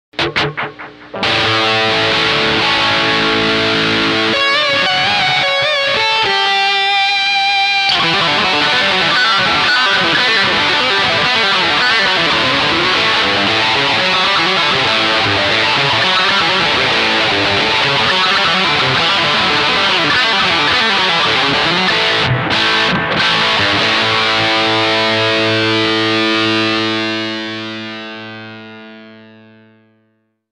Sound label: Lead; VOX